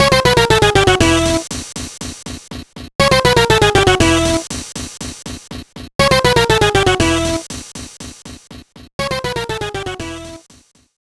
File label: Bowling - Miss; super monkey ball jr.; gba